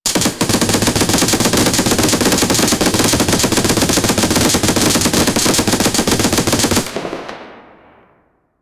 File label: rapid fire from a semi-automatic pistol